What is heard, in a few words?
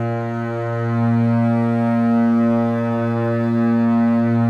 Contrabasses A#2; Best Service ProSamples vol.51 - Classic Orchestra 2